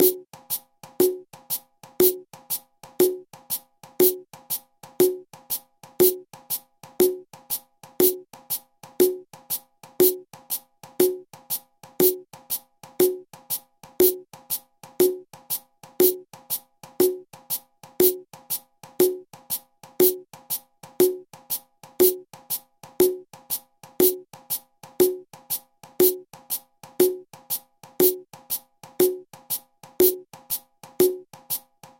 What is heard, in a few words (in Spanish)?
abakua matanzas binkome